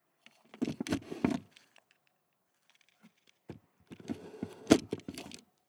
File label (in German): Filmprojektor Tondo kleine Rolle ab und dranstecken